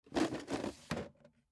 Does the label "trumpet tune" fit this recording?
No